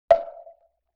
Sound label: Temple Block Hit Roomy; Vintage Cartoon; Drums & Percussion